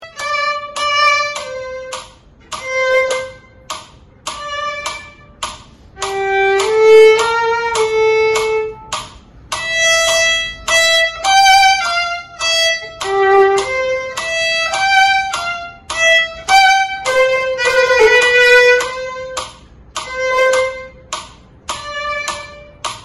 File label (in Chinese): 小提琴; 示範演奏; 玩具交響曲; 篠崎小提琴教本 新版; 第二冊 第二部